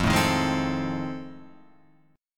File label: F 7th Sharp 9th, Guitar, Open F; F7#9 strummed